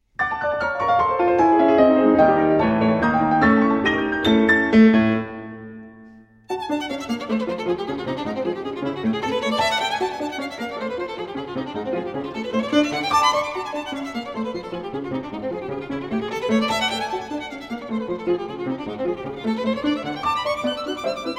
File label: Violin and Piano Burleska; Suk 4 Pieces For Violin and Piano